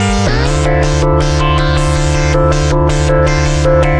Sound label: filter stepping, synth